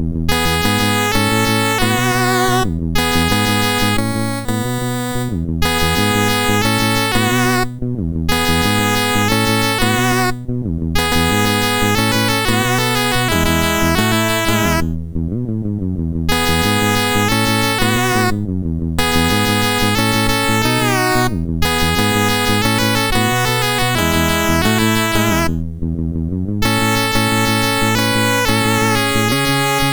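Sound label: Commodore, MOS6581R4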